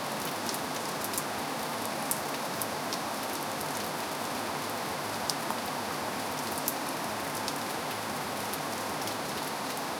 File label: heavy rain pouring in a